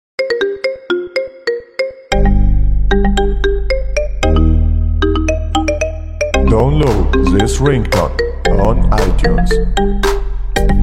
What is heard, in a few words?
Marimba